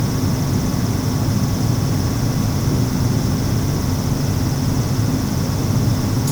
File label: Noise Normalized